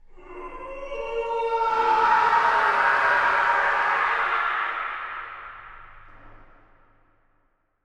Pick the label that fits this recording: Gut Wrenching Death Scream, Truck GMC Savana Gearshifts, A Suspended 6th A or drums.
Gut Wrenching Death Scream